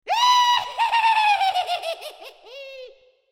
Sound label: witch laugh